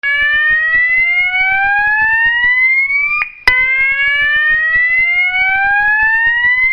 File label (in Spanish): sonido electronico